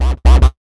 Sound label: ROCK 132 FX, Big Beat Drumloops